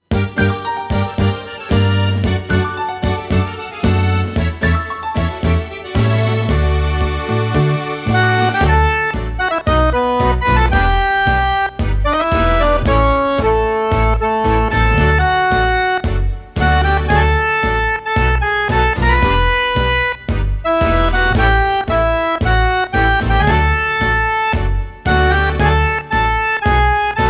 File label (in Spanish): instrumental Tango